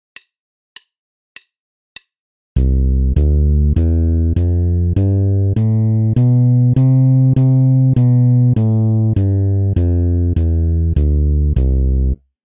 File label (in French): Gamme majeure de Do, basse; exercices